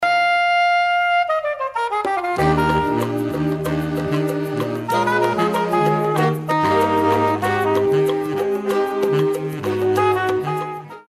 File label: jazz